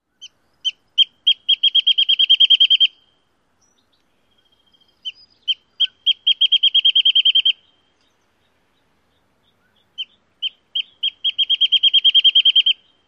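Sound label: song, Wrentit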